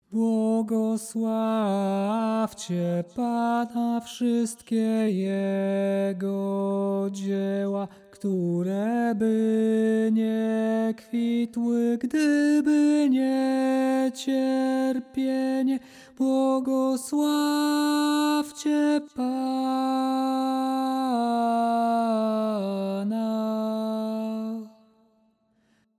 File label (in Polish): tenor; Błogosławcie Pana